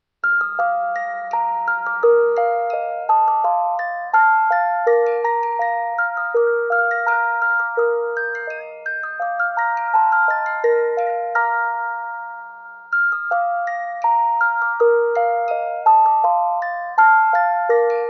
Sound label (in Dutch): glockenspiel; W.A. Mozart